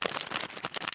card shf; Infobase

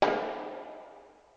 industry hit g3; PC Gamer IT CD 40; SMACDEMO

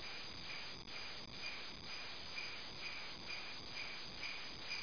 BELLS; 10,000 Graphics Pack Volume 1; Media Graphics International

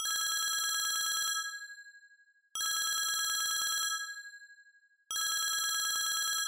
ringing

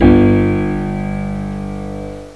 piano g chord

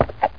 clop; Hexen II; famine

